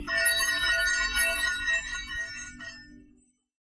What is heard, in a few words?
astrolabe spin; smite; god atlas